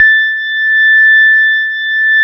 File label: DX PIANO; East West; Twisted Textures-BT